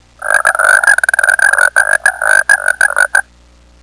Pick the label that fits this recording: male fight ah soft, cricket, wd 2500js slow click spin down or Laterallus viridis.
cricket